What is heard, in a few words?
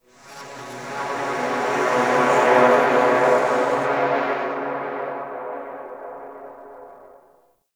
Wind Pipe One Off; Ambience; Dante Unbound; Warframe; Entrati; Dead End Secret